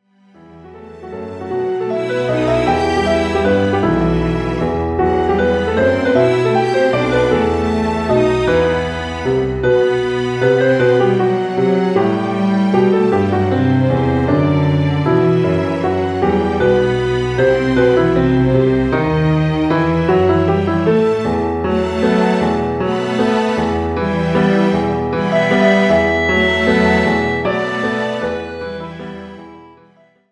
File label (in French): VALSE RAPIDE